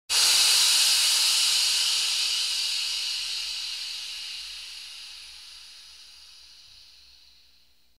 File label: Steam Release